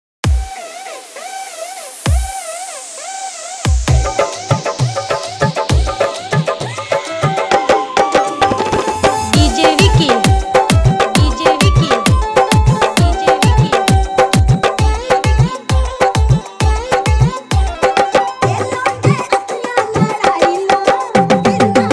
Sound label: Bhojpuri Remix